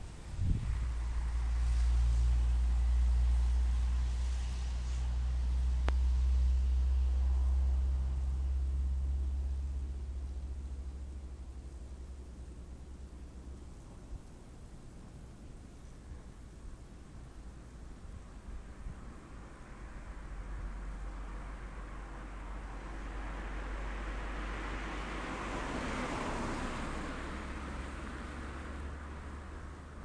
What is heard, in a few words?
electricity